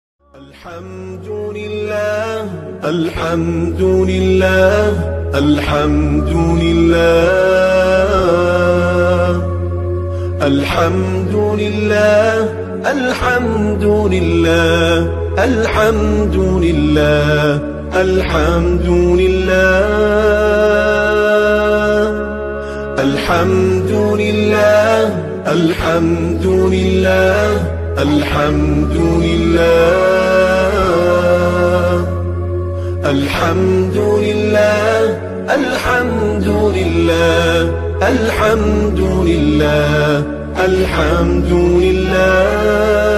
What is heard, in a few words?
Best Islamic Ringtone